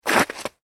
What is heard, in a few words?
human footstep slushy snow; Single Footstep On Slushy Ice / Snow; McKinney Sound